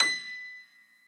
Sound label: piano